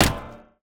Metallic Object Hit Impact

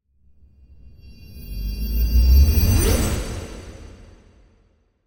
Magical Transition Washed Out Riser

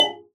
Gadget Click Button

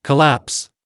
Male Collapse Voice

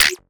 Percussive UI SFX

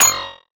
Blip Highkey UI SFX

